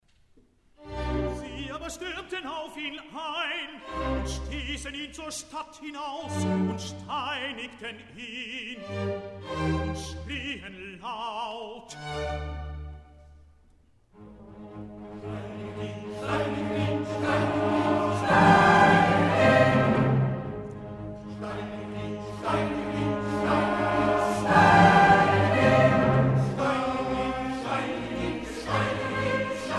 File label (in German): Rezitativ und Chor; Paulus